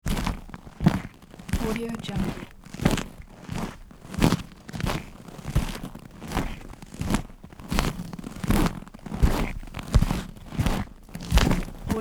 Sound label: foots snow ice